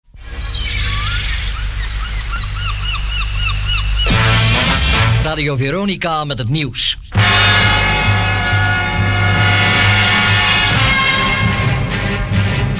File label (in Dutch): Jingle met het nieuws; Radio Veronica